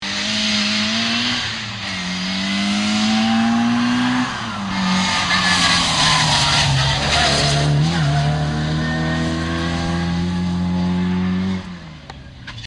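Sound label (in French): mini, rallyes; Mini Laugier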